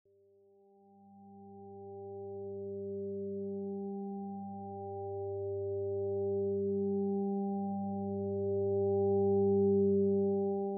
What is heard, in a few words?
396Hz Energy Enhancer